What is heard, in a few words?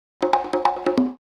PERCLOOP TEKNO 140; Techno2